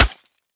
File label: glass, bullet impacts; Half Life Model Pack